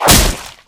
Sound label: arrow impact flesh